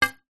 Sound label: sport pool cue base tap hit hard ground